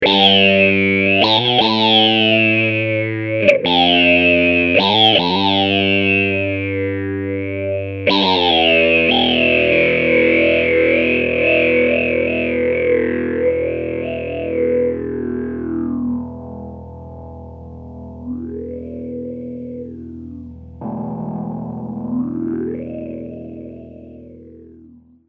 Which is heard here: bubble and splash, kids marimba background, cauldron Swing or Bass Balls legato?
Bass Balls legato